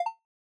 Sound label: copy, audio feedback